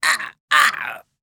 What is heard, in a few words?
VO Death; VIP